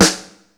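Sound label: snare drum, Casio CTK-5000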